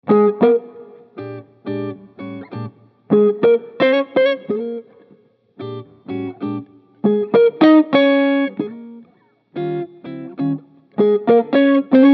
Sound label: jazzbox